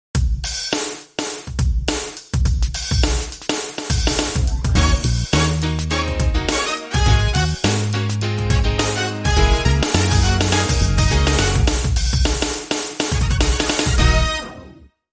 Phunky Horn

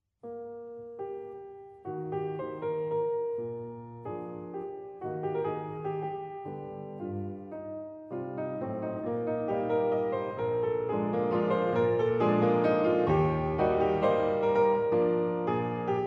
Valse in E flat major; Brown Index 133

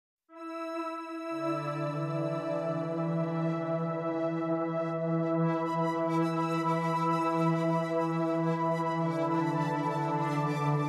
Strings Only

